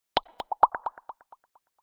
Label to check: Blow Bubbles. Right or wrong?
Right